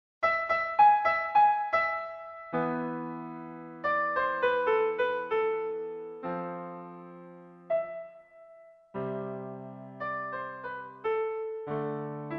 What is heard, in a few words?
Peasant's Flute; B. Bartok; For Children 2 n.26